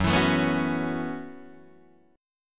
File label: Gb Minor 11th Gb Guitar Open D